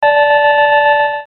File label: slots lose